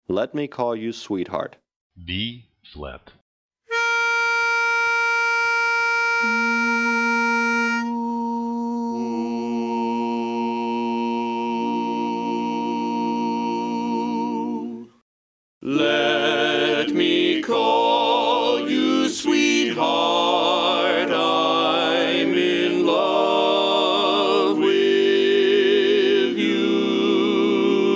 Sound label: Lead